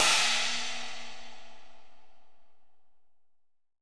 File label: 22 China, crash cymbal